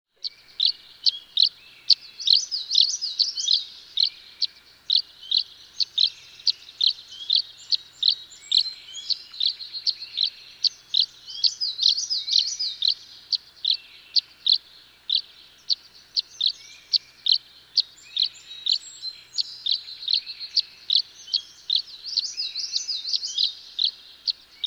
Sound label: Tree Swallow